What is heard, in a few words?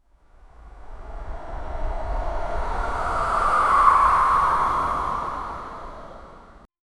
urban ambience with faint; surreal